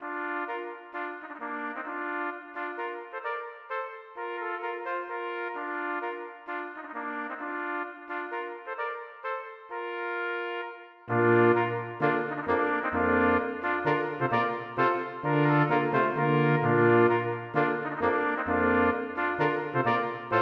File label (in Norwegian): Menuet for messingkvartett m Baritone og Tuba; Aamodt